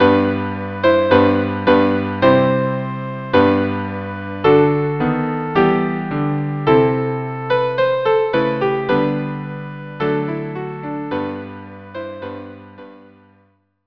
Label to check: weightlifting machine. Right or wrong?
Wrong